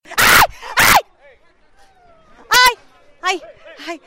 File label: ahhhahhh